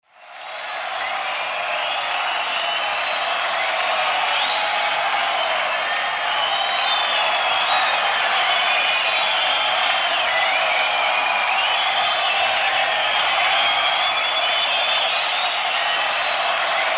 Rock concert, large crowd with whistles and shouts